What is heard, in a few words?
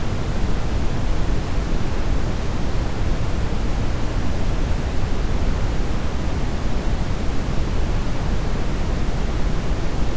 Scatter Noise